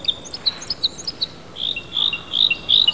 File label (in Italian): Crociere fasciato, uccelli